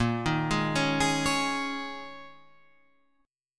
Ab Suspended 2nd Suspended 4th Bb

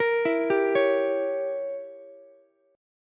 G Diminished 7th, Ukulele; arpeggiated; 15,16,15,16; JGuitar

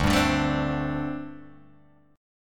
D 7th Suspended 4th, down, Guitar, Open G

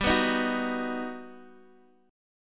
G Augmented Major 7th G, Guitar Open D; x,x,5,5,6,4; down

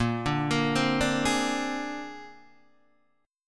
Bb 9th Bb down Guitar DADGAD

